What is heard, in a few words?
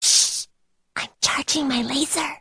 charging my lazor